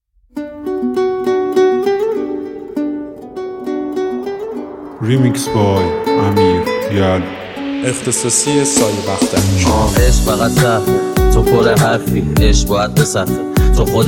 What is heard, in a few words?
Remix